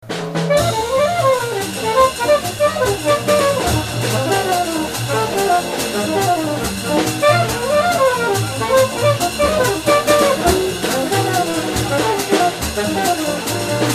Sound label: Bb Rhythm